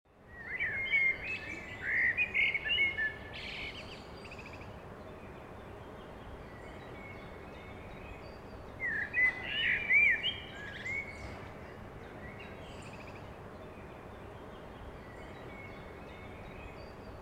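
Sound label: city park, very early morning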